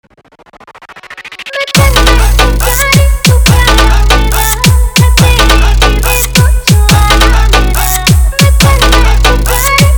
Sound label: Haryanvi Remix Song